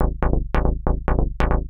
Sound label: TECHNO BASS 140 A